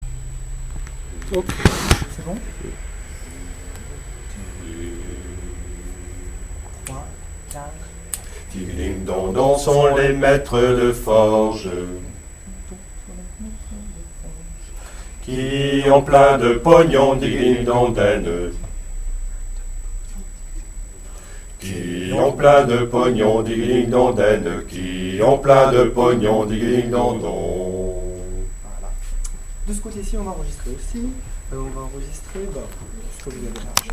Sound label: mdf basse